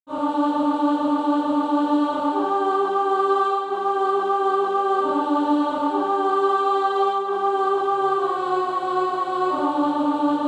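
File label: alto, Sheet music (SATB) including Practise Music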